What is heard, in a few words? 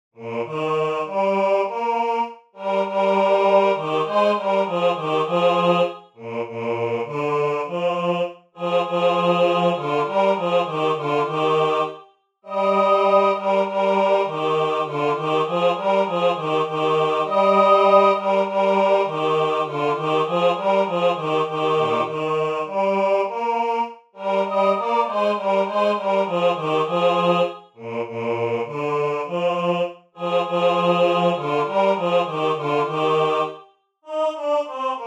tutti; Wer klopfet an